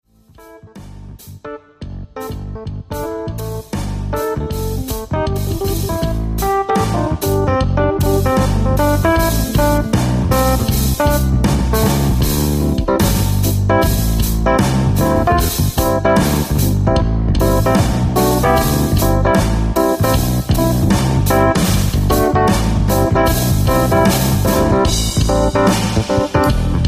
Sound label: Foot Drumming Essentials